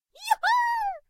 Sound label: bounce